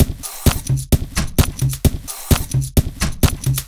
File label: Beat (130BPM), household rhythm; Loops